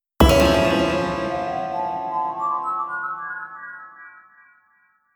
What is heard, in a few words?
Miss!!; OST